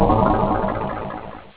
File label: level start; outpost